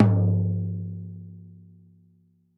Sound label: tom lo, gretsch kit